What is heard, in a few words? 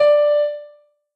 noteblock d2; mesecons